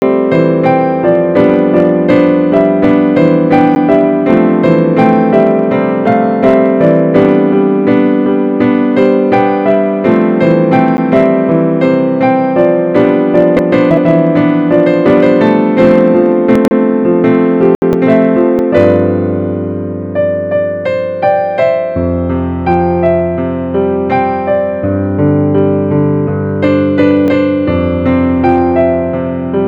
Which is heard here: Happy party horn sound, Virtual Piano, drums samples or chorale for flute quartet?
Virtual Piano